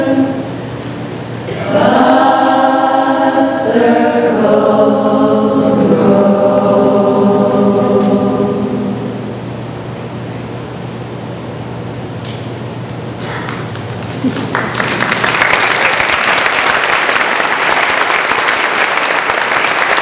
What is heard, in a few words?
noisy audio; sony vs soundtrack pro; noise reduction